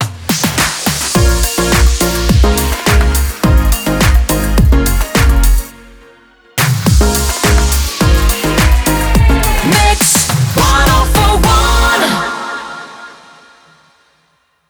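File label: 105bpm MIX Ramp, jingle; WBMX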